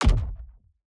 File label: environment block destruction kick